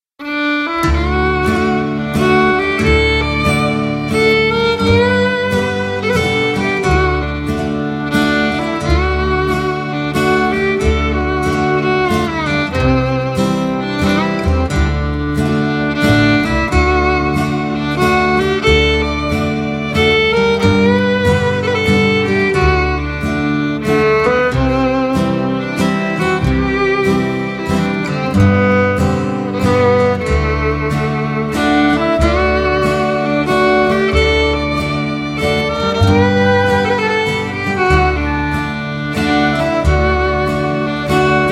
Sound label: Waltz; Nancys Waltz